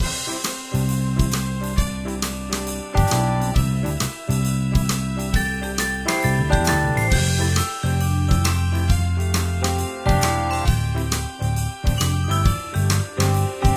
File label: Music; Diner Dash 5 Boom